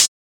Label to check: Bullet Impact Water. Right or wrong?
Wrong